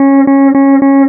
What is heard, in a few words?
Blipp Wrong